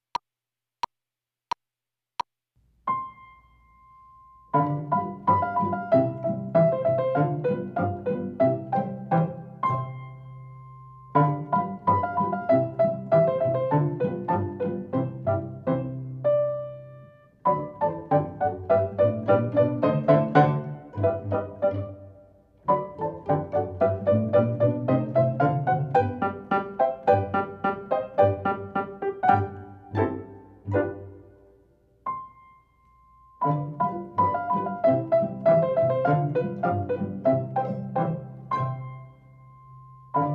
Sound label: Pizzicato Polka